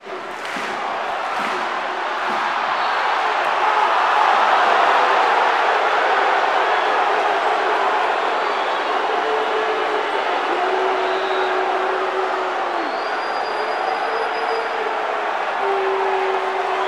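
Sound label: Basketball Crowd Reaction; Crowds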